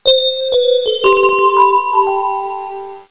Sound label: Joy To The World Beep